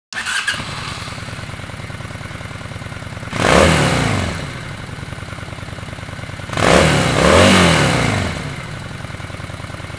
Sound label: start, motorcycle